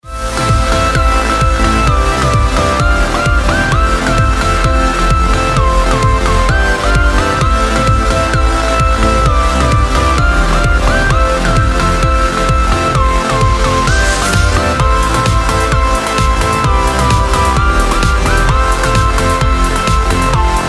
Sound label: Edm After